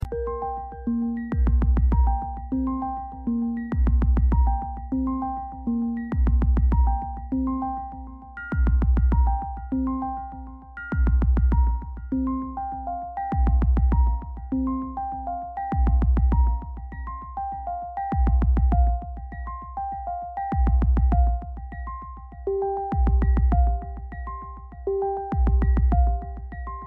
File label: Beat Wave